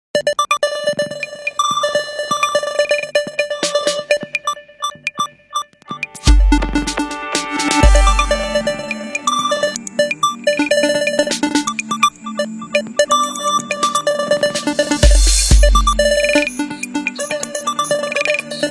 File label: Glitch, Nokia; Nokia N8; N8 Belle Vietnam